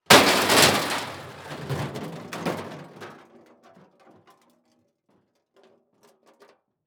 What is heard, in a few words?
Crash Impact Car Crash Long; Crashes